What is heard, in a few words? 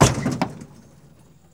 TRUCK JUN, Transportation, Truck Junk